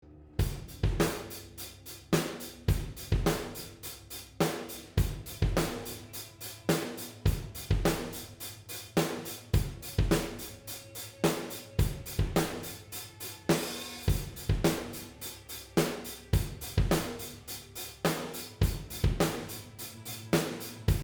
Drums EQ; se electronics x1 d; میکروفون استودیویی و حرفه ای اس ای الکترونیکس X1 D

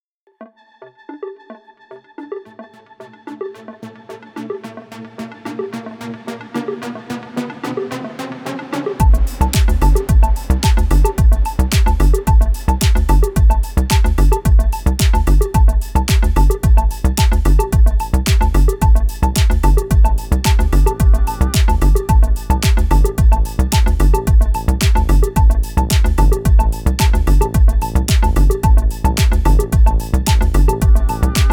Arturia MicroFreak Chill Street; Solidtrax

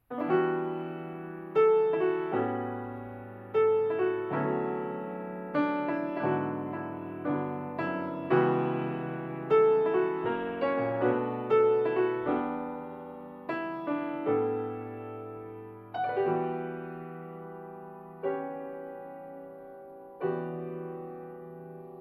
far miked piano; antelope audio fpga fx

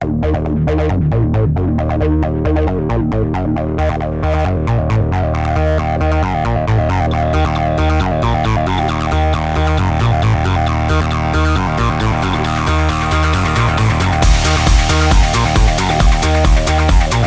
UNO Synth Song; ik multimedia uno synth; سینتی سایزر و سکوئنسر iK Multimedia Uno Synth

